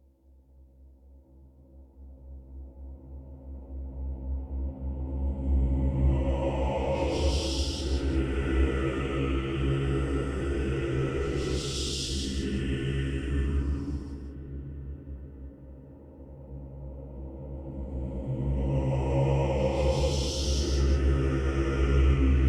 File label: demonia chorus, ambience music; PhoenixCraft-1.16.5